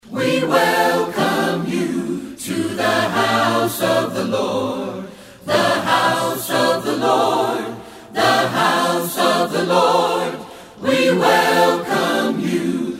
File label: Acapella